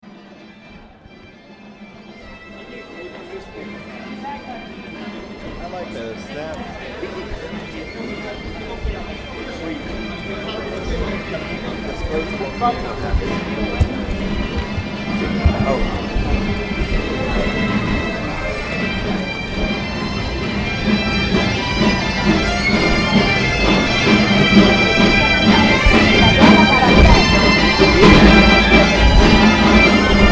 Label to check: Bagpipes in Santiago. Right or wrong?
Right